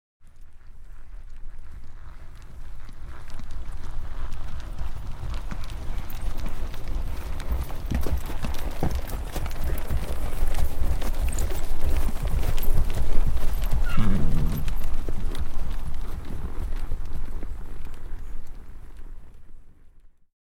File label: Single Horse Walk By; Historical; Hollywood Edge Historical Series; War Horses Armies & Weapons